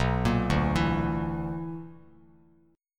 B-Minor Major 7th Double Flat 5th, Bass Standard; arpeggiated; JGuitar

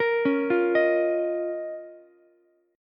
C sharp 6th Add 9th C sharp Ukulele C6; arpeggiated; C#6add9; 15,13,13,18; JGuitar

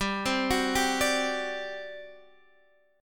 G Major 7th Suspended 4th, Guitar, Open D; down

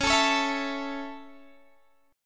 Db Minor Sharp 5th, Guitar, Standard; strummed

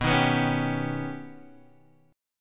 D sharp Augmented 7th B, guitar

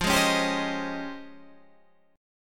Db Major Sharp 11th F

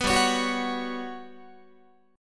G Major 7th Flat 5th, Guitar; GM7b5; strummed

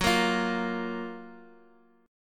Gb Major 7th, down

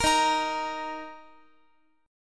Eb 5th, Guitar; Eb5 strummed; JGuitar

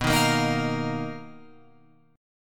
B Major 7th Flat 5th B down Guitar Open F; strummed

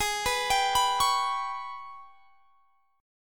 Ab Minor Major 7th Double Flat 5th, chord; arpeggiated; AbmM7bb5; x,23,21,24,24,21

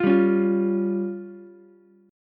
F Suspended 2nd Sharp 5th, F, Ukulele; Fsus2#5 strummed; 10,7,9,8

